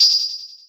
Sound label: sword; buckler